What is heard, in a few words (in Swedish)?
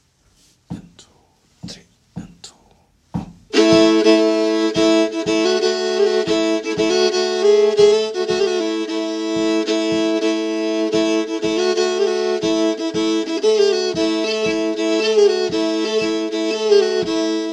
riff A del; Tusenkronorspolskan